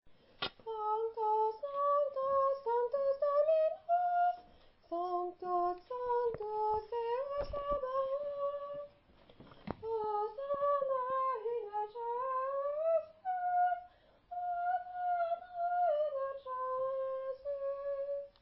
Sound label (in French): Contrechant femme